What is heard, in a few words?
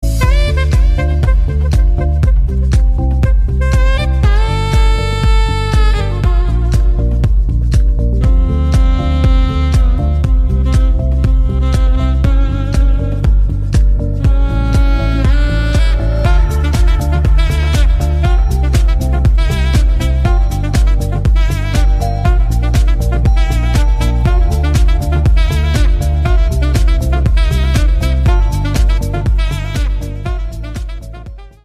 Sax